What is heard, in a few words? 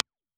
Toshiba Type K flat